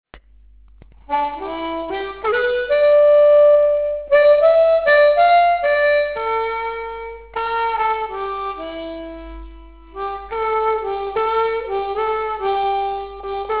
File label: blues